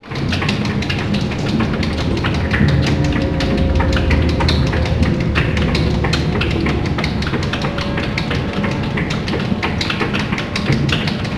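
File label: SFX compressor; Higurashi no Naku Koro ni Matsuri: Kakera Asobi; PS2; SFX konpuresar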